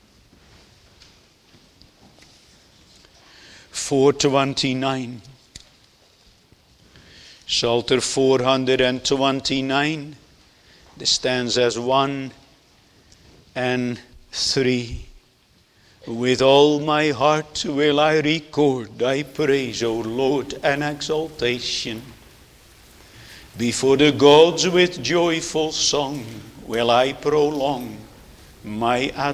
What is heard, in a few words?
Singing; jesus mercy to one taken with palsy